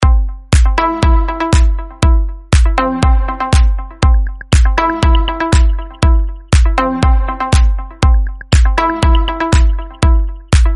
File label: sweet beat